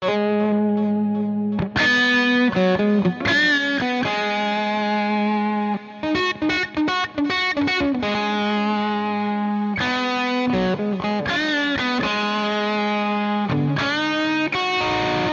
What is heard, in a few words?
Phaser Whooshing Tone Guitar Dry; Chromacycle; Syntheway